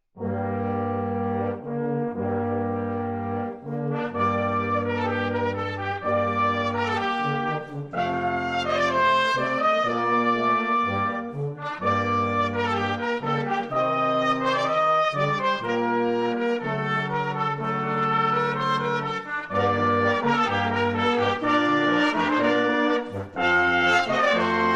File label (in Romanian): Fanfara, Uvertura crestina